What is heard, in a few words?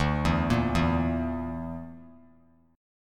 D Suspended 2nd Sharp 5th D; Dsus2#5; arpeggiated; JGuitar